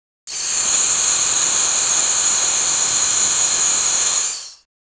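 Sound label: steam engine hissing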